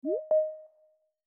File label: Teams Meet Up Reminder